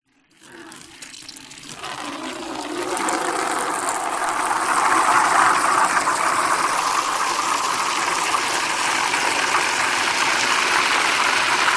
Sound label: household plastic bucket fill with water from hose